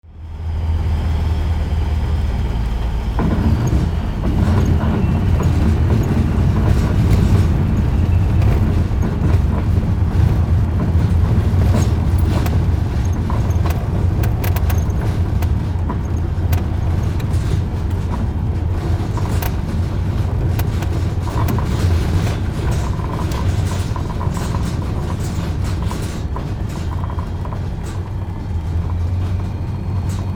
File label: motorized glider landing